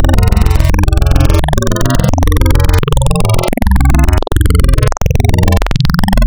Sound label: Spectral, wavetables; Architecture 32